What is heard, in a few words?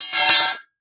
Sword Drop On Ground, weapons; AdobeCC